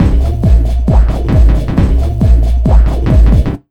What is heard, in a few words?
Big Beat Drumloops, 135